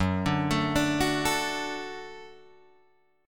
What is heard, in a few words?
Gb Minor Sharp 5th Gb Guitar Open G